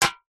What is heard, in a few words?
food drink soda can empty set down concrete ground